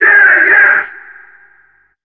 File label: Big Beat Drumloops; USB Soundscan vol.25; AKAI